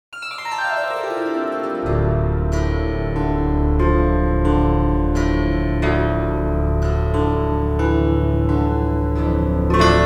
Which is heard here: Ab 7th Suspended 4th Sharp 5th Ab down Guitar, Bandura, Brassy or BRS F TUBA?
Bandura